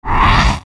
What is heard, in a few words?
Sweeper Low Fuzz Ascend; Production Element Imaging Element Accent Transition; Sweeper Sound Effects